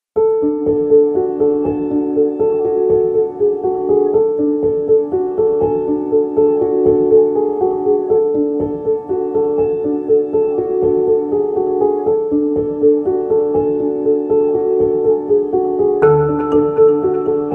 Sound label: No Synth